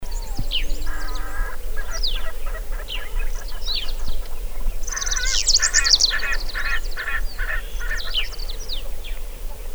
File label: Erckel's spurfowl